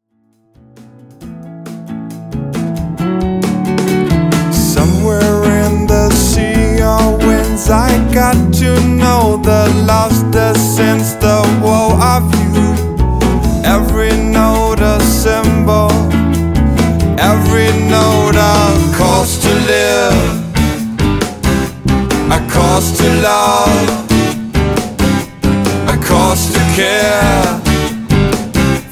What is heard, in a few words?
jazz